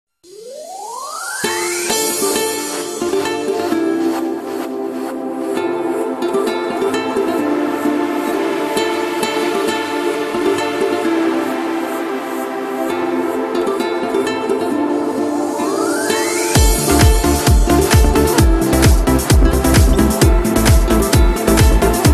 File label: super guitar RINGTONE